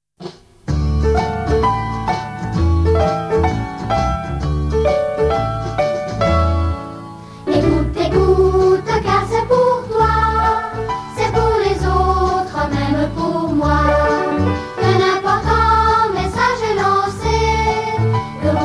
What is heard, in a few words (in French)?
chant ecoute, Un nouveau cantique : "Écoute"